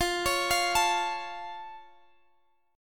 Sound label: F Augmented, guitar chord